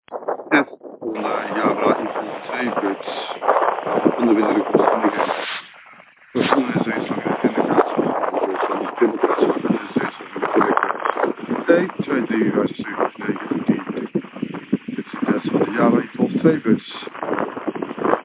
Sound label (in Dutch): Direct wind